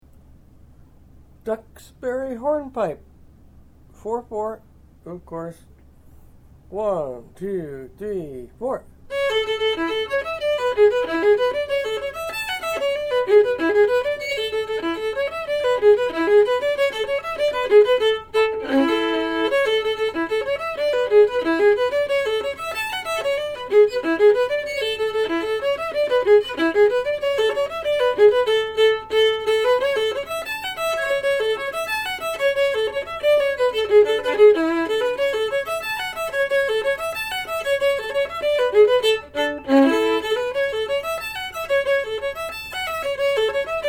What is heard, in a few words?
Hornpipe, Fiddle; Fiddle chords